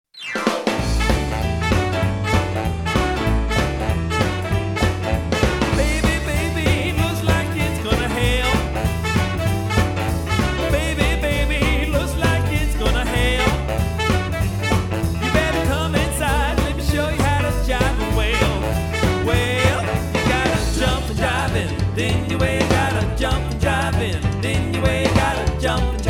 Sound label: Variety Band; Atlanta